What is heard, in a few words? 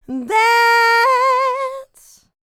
licks, vocal, dance; ILIO; Vocal Planet